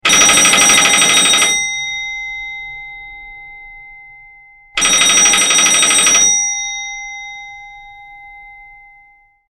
very old telephone ringing ringtone

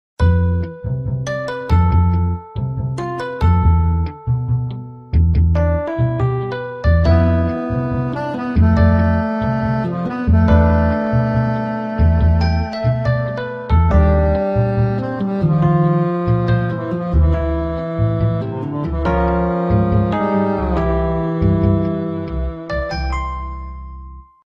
bossa nova

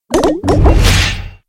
bubble text message

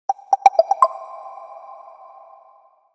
samsung on time notification

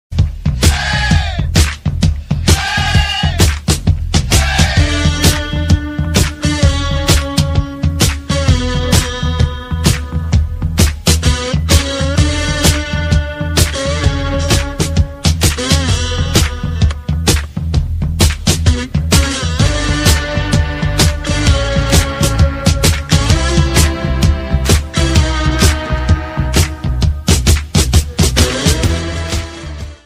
Rock N Roll Ringtone; Joker Stairs Dance

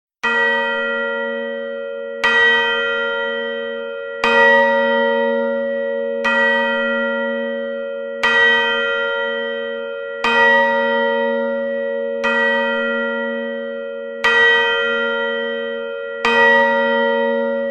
midnight chimes

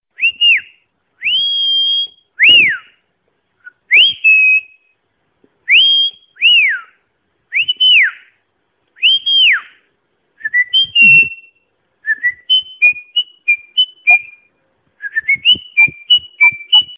whistle ringtone

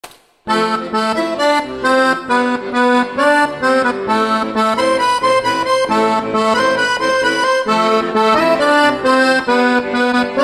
Bansuri Ringtone

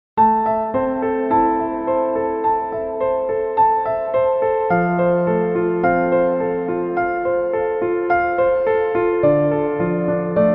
mysteries piano ringtone

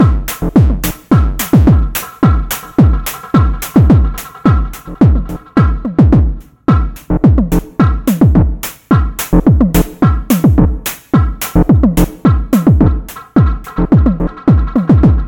techno beats ringtone

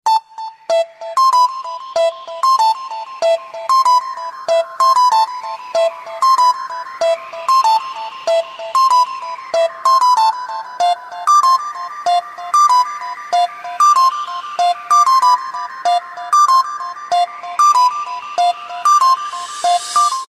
light ringtone

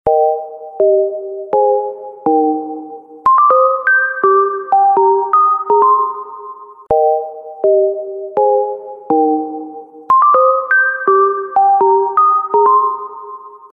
ringtone

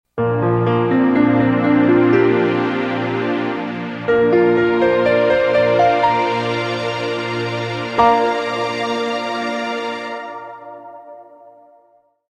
gentle notification ringtone

